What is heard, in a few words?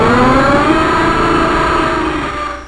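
elevator down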